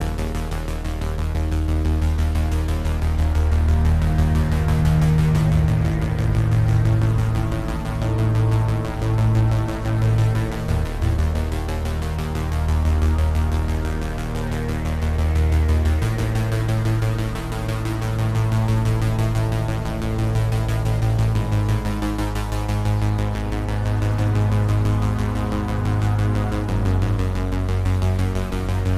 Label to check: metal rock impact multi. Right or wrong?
Wrong